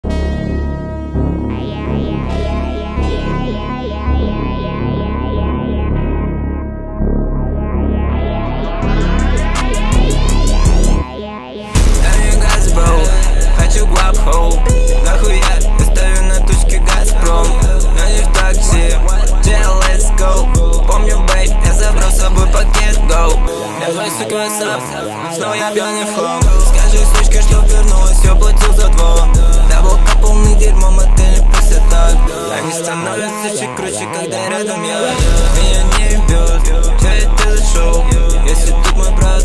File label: pop